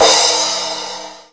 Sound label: Crash Cymbal Long; GMPercussion; 3DO; Toolkit 1.5; GMPercussion22k